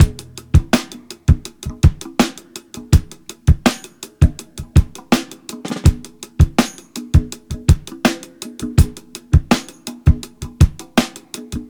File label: 109 bpm drum loop f sharp key